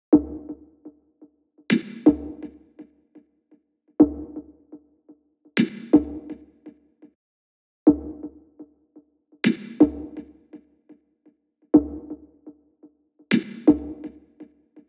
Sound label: loop perc 124; eugenia